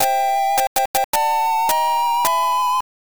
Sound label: goal; Super Mario Land; The Cutting Room Floor; Sml1 v1.1